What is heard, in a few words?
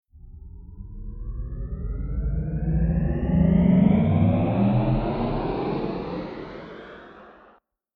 Power Up Riser Digital Scifi Space Ship Computer Long; Sound Design; Ascending